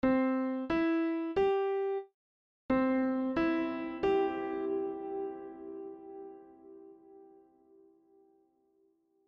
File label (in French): Piano avec et sans reverb